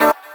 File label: D STAB, Funky Underground